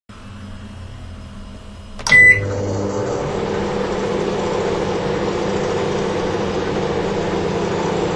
trafo, Mikrowelle

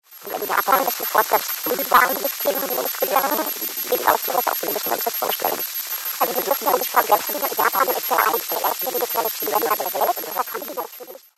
Oktavverschieber

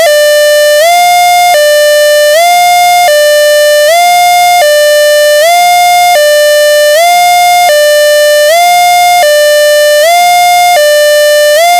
sirene

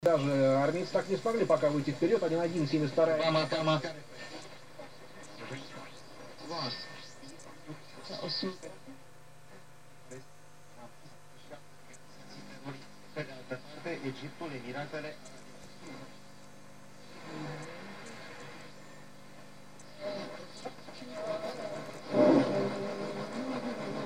MW Durchstimmen

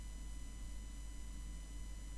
Nur Fiepen

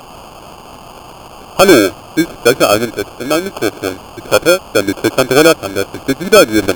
Aufnahme bei 19200Bps

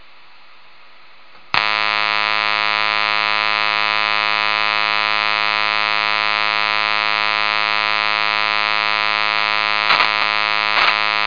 Digitalmultimeter am Detektor DCV

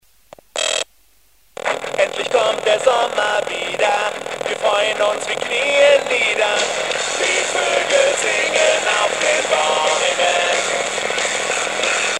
brummen

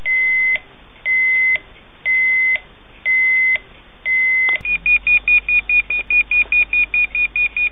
Alarmgebertoene

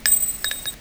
PWM lautsprecher mikrofon gut; WT588D-U Voice Module

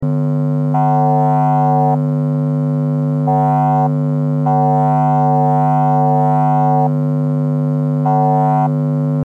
Netzspannung 50Hz Notch

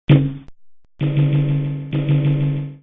Einschaltstromstoss Trafo; Korad KA3005DS Netzteil; Einschaltstrom Korad KA3005DS Power Supply

